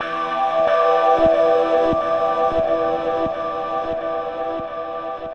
Ice Pad

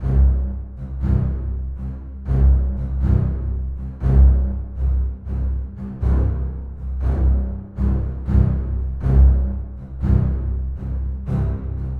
Bass String

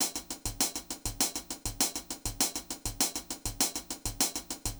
Bounce C, сэмплы ударных в стиле funk

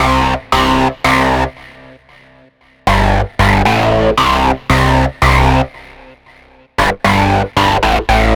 Hard Core

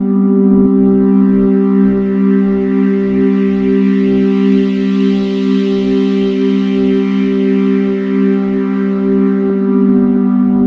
Calm Pad

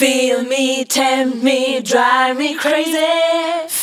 Feel Me, женский вокал

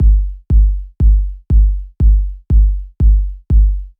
Soft Kit C; Electro Dub

